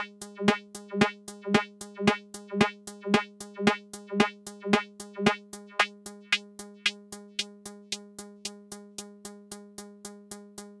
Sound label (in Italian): lavoro sul filtro reso e hp